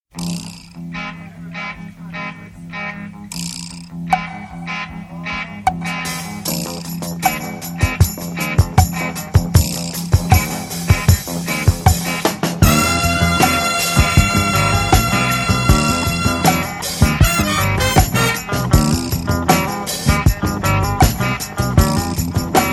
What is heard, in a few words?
groove